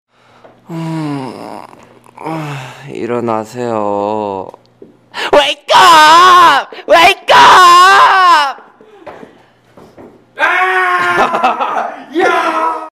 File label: Alarm Wake Up